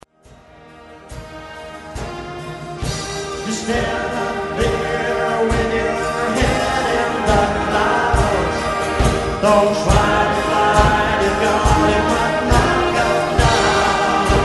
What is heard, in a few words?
hymn